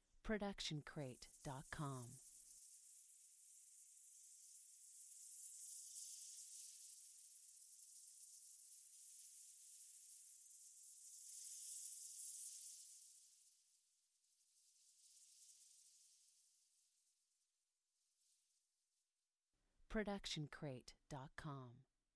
Magic Portal; SoundsCrate

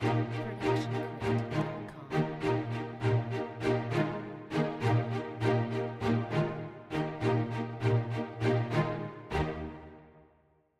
cheerful strings logo; soundscrate

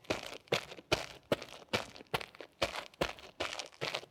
loopable jogging on dirty ground; soundscrate

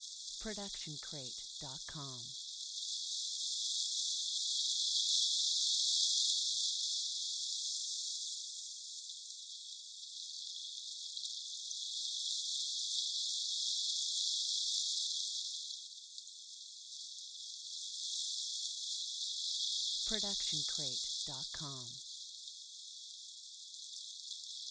Night Time Insect Swarm Noise; SoundsCrate

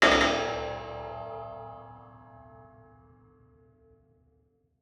horror metal hit; soundscrate

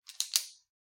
pen click; soundscrate

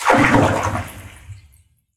indoor water splash reverb; soundscrate

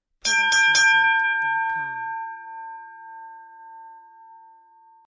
boxing bell

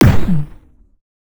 sci-fi blast

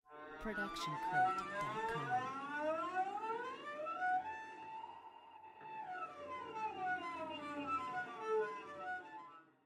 Cello Slide Up Down; SoundsCrate

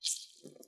blood squish

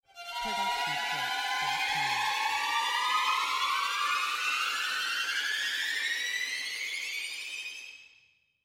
trem

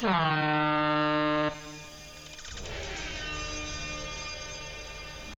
air horn designer; soundscrate

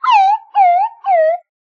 slide whistle good laugh

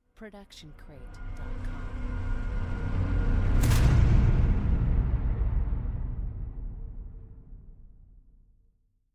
horror hit; soundscrate

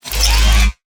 scifi

